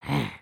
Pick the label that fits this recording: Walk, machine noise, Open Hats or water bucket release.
Walk